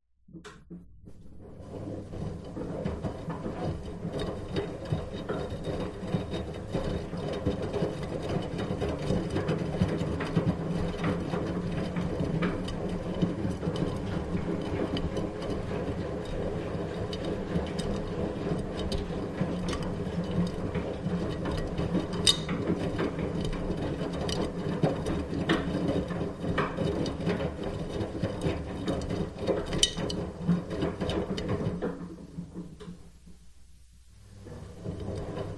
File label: household washing machine cycle slow spin close to glass door